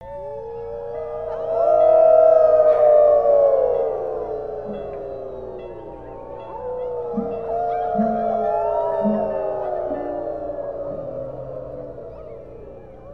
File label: Bare bones howling